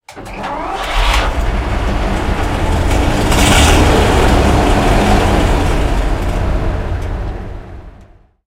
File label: panzer3 engine int start, tanks; engines; War Thunder